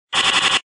card give; games; poker; American Poker The Legends II

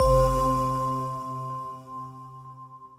prize bonus; Great Book Of Magic Deluxe; Wazdan

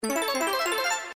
robots expanding symbol win

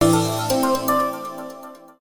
expand; Fruit Express

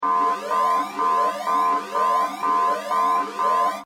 UI Warning; One Shot Fishing

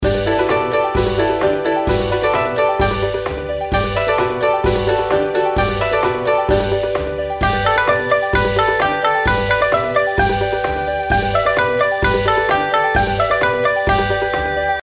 intro bgm; Yun Cai Tong Zi

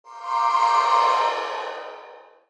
sunrise; Beetle Star

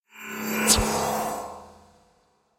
gambler enter; Magic Stars 5; Wazdan

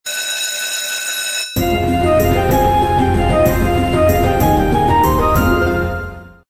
WIN MAX; Kings Jester

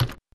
reel stop; Racetrack Riches; megaboard

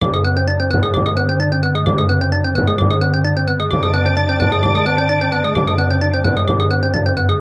count up; Book Of Lords

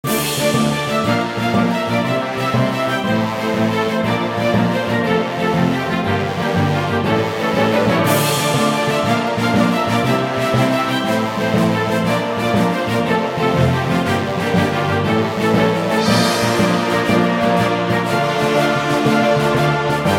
free spin melody; Dragons Kingdom

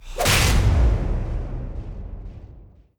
symbol pitchfork; Demon Jack 27; Wazdan

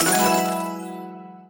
win count loop tail; Xing Yun Jin Chan

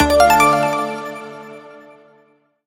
multiline win; Magic Stars 5; Wazdan

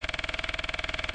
gamble suspense; games; poker; American Poker The Legends II

